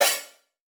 Multimedia Internet CD-Rom Flash Ding

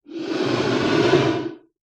Animal Reptile Lizard Water Monitor Hiss Single; animals; AdobeCC

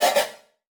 Flash Whoosh Multiple; Multimedia Internet CD-Rom Flash

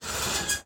Shower Curtain Close, Household

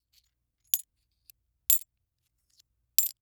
Coins Movement Counting Multiple; Foley

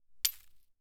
Weapon Bullet Shell 40 Drop On Leaves; AdobeCC

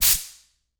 Industry Tool Air Escaping From Air Compressor

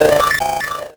Title Transition Data Transmission Computer; Production Element Title Transition

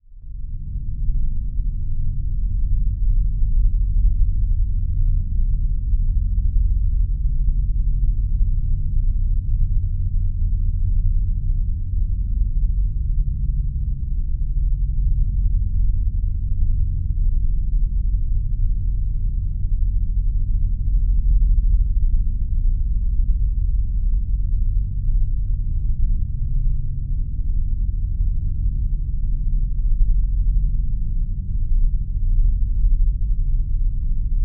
Drone Deep Mellow Rich Bass; Production Element Imaging; AdobeCC

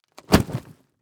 Impact Treasure Chest Drop On Dirt

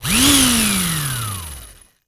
Industry Engine Power Saw Quick Burst

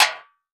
Impact Pan Tin Hit By Wooden Stick; Impacts

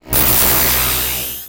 Transition Electrical Distortion

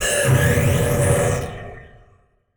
Title Transition Communication Distortion; Adobe; Production Element

